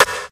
treble heavy acoustic snare sample b key, drum single hits one shots